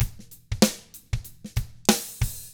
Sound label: 95 funk, drums; ILIO; Double Platinum Drums 1